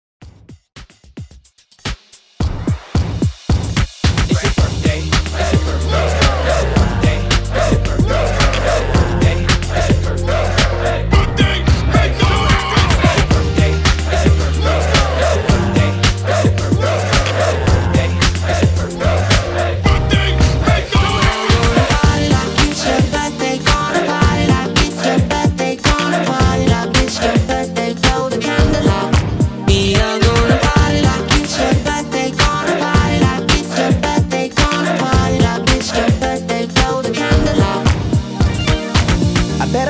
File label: 110 BPM